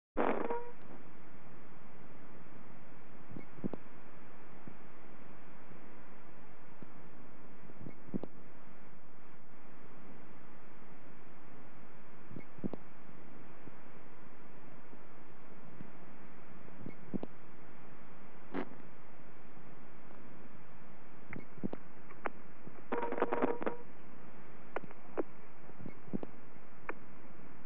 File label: BT Lines AND Tones, Internatio; Computers Printers Phones; BBC Sound Effects Library